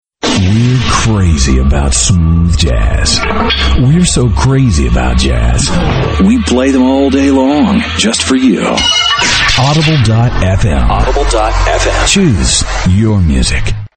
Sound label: Smooth Jazz; Audible FM; ADULT CONTEMPORARY; RADIO IMAGING